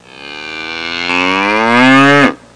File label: Cow mooing close